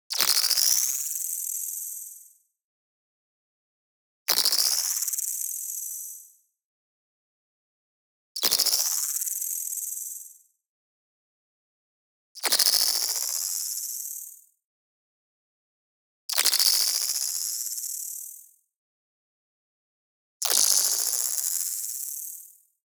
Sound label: movement fast, molecule flutter; boom library; Anime Essentials; DSGNMisc